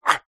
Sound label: human fight karate vocalisation male